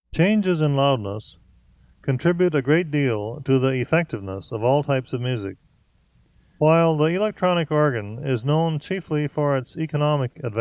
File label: Volume Changes; lecaine; Compositions Demonstrations; Le-Caine Hugh